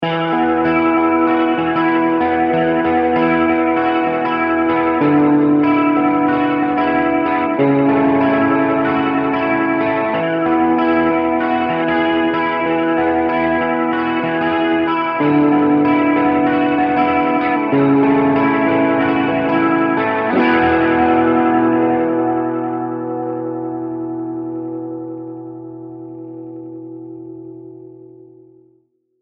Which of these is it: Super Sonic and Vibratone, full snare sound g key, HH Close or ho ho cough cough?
Super Sonic and Vibratone